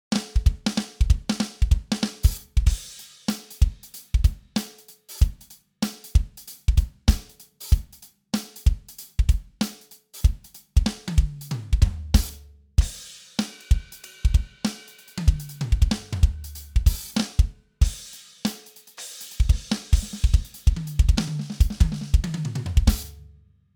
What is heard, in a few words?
gospel smack kit